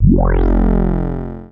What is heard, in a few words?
Single Electric Basses, Dance Floor Bass; Best Service